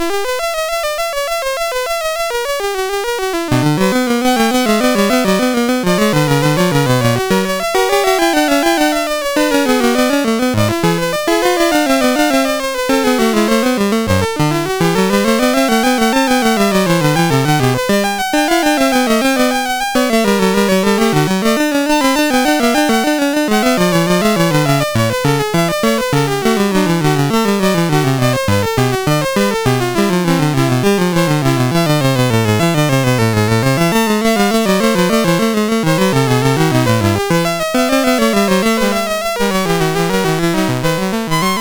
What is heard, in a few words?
Fugue No 2, MOS6581R2; Fugue No 10